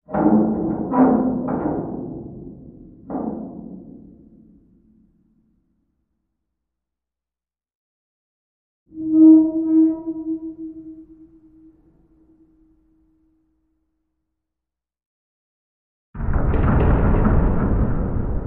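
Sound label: Metal Creaks Eerie; ambience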